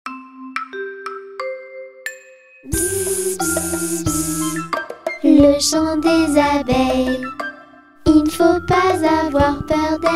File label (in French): Bzz Bzz Bzz Le chant des abeilles Chanson pour enfant